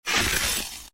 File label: ice magic trail